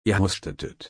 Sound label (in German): husten